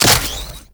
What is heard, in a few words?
Crafting Equip; Cetus; Hunter